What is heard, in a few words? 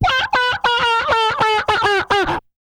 WAH PERC, MALE PERC; Spectrasonics; Vocal Planet; Jazz FX